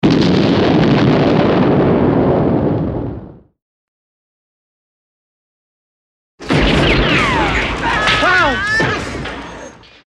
explosions mix

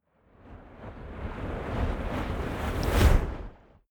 waves surge shot decay

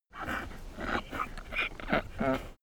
donkey grunt snort

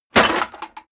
metal plate armor hit

